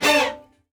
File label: D string hit